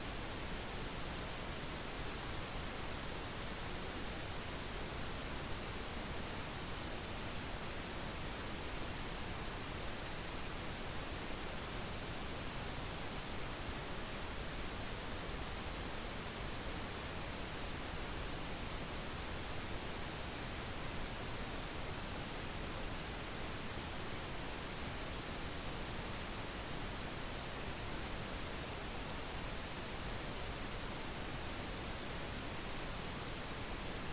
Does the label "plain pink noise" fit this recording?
Yes